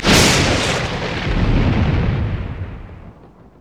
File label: rolling thunder; LoL; volibear